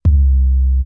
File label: urban trap kick; Urban Trap Kit; Hip Hop; STYLE KITS (Hip Hop, Etc)